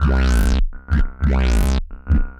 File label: Roland F Line, Roland S100